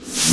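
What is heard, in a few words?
snare reverse, DnB Breakz Kit; Breaks N Beats Sound Kit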